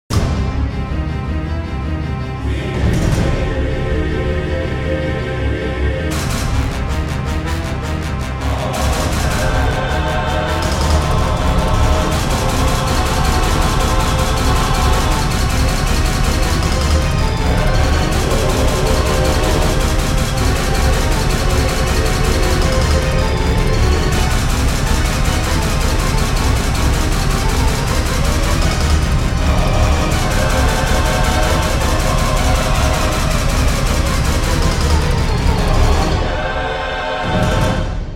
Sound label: Heavy Orchestra; Experimental